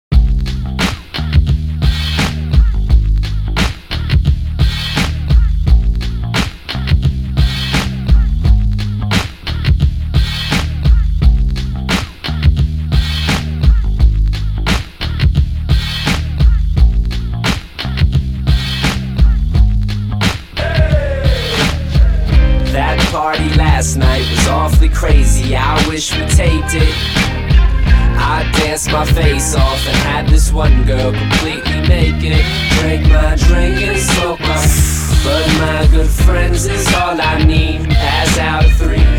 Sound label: bpm 86